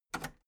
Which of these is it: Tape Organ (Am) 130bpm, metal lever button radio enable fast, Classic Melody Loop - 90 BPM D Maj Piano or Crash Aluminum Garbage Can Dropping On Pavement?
metal lever button radio enable fast